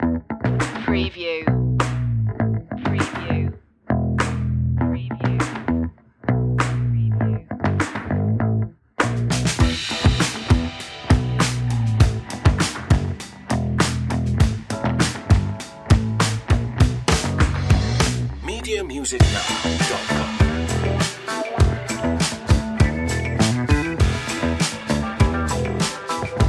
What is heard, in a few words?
feel the funk